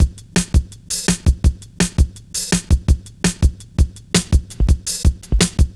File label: Reece Steppa, Drum Loops 166.5; Zero-G Jungle Warfare